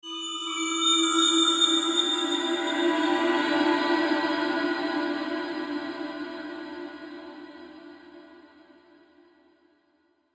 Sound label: Lens flare transition